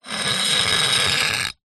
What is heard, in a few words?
household garden trowel scrape on concrete